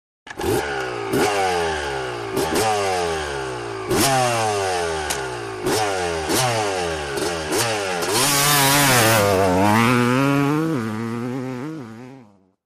Motorcycle Motocross; Start / Revs / Away 250 Cc Honda, Starts Up, Revs And Drives Away, Shot Cu.